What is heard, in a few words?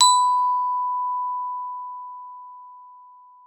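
GLOF B3, glockenspiel; Xsample VOL-4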